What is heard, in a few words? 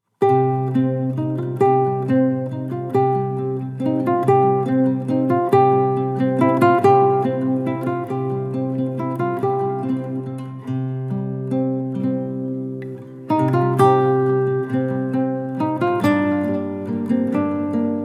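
guitar; game of thrones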